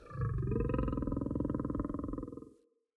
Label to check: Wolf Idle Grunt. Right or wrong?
Right